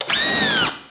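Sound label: nozzle; Star Trek JR Audio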